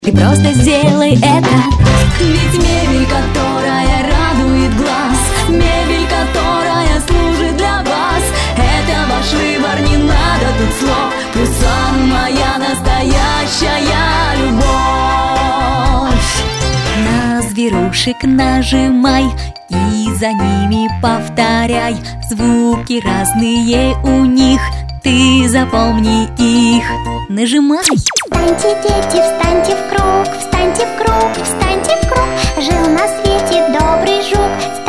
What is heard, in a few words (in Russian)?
vokal